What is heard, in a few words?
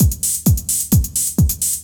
groove; Computer Buyer 1997 April